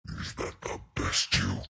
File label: big pain; Undying